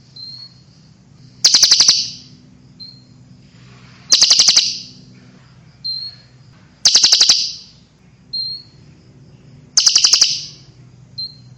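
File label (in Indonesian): kolibri ninja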